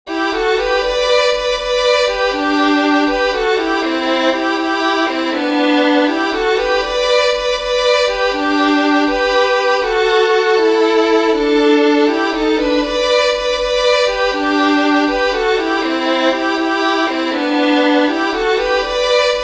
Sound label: BEAUTIFUL STAR OF BETHLEHEM DUET; peifiddlers; Merry Christmas